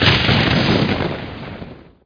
Lightning, Weapons; Heretic II

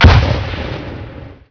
high exp grenade det; SpecOps2 MPlayer Demo

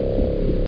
mill

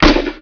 M203 launch; SpecOps2 MPlayer Demo

